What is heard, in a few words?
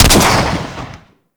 Swarm Missile Launcher Fire 2D Shot; Warframe